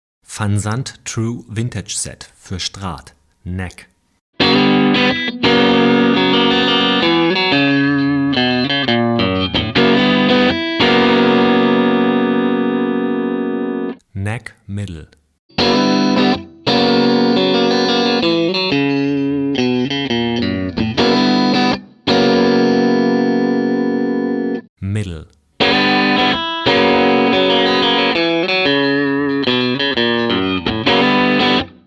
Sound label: Van Zandt True Vintage Set for Strat; Vintage Pickups für Strat